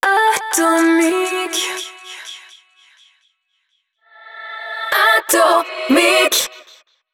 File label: JINGLE acap; ATOMIC; HABILLAGE ACAP